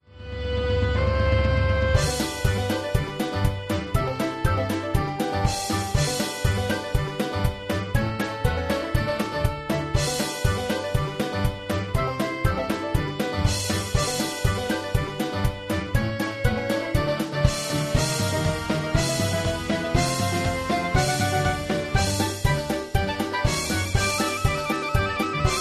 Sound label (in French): instrumentale